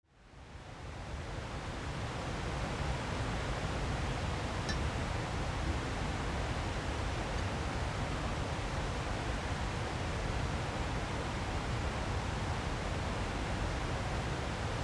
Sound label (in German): Um die Hütte Ton